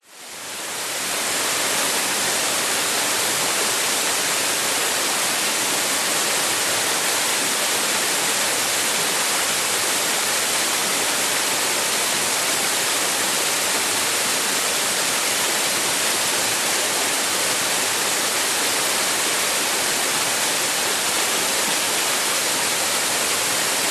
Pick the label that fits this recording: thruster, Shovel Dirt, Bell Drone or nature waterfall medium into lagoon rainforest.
nature waterfall medium into lagoon rainforest